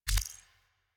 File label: UI Default Theme Drag End; Warframe